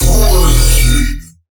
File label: E, Dubstep Bass Hits; Tesla; Ghosthack; Advent Calendar 2017